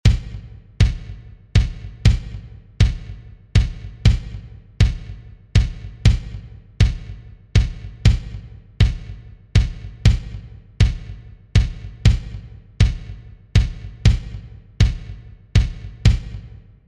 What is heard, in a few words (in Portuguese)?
ritmo baião